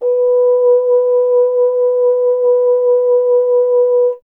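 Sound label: BRS F.HRN, Solo Instruments, French Horn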